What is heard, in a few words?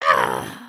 collapse